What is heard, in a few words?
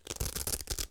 Velcro close; Phasmophobia